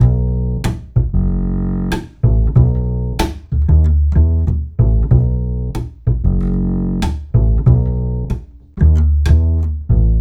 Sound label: Acoustic Bass